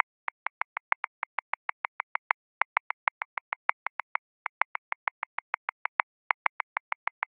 6 Feel Groove; Grooves; Ableton; Factory Packs

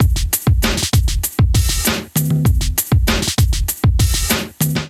Groove 89 Bpm, Drums; MIDI Clips; Zoned; Ableton; Chop and Swing

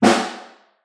Snare Far

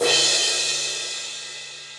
Brush Crash 18 Club Sizzle; Drums; Drum Hits; Standard Hits; Cymbal; Ableton; Factory Packs; Session Drums Club

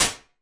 SMALL BOOTH, Drum Reverbs, IR Samples; Convolution; NI Kontakt 5

